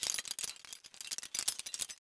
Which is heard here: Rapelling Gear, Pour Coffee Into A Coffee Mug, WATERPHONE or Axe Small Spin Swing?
Rapelling Gear